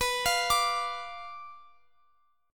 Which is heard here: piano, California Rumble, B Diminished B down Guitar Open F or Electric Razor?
B Diminished B down Guitar Open F